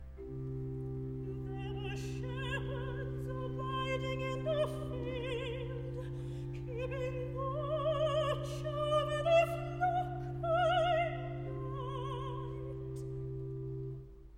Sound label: soprano; part 1, nos 14a, 14b, 15, 16, there were shepherds abiding in the field